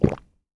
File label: gulp; AKAI S-Series CD-ROM Sound Library VOL-6; HUMAN SE